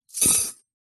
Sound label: household cutlery handful set down on soft surface; zapsplat